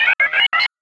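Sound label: sphere blips large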